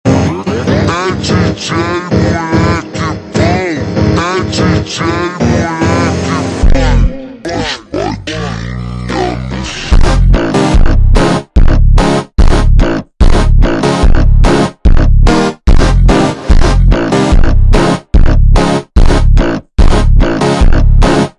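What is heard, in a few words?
mega Slowed, phonk music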